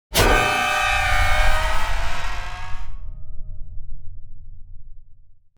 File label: Granular madness impact jumpscare suspense